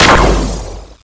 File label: railgun; svencoop